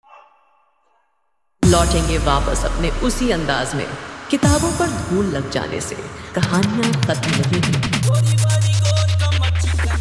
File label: Bhojpuri Dj Remix Song Hard Dholki Vibration